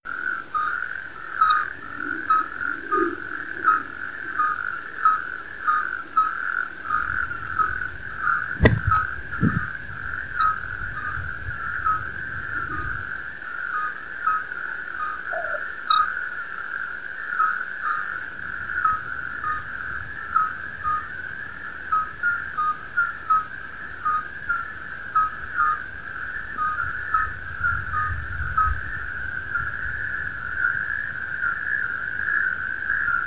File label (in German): Audio Konzert Geburtshelfer Kreuzkroeten